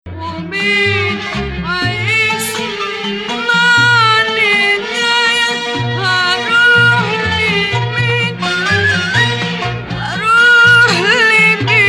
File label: 2nd Rast, maqam